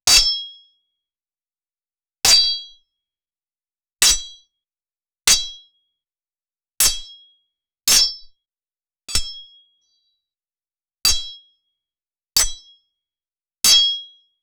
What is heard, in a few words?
swords deflect sound